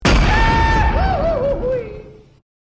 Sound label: goofy ahh yell; memes